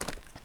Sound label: step; Android Games: Village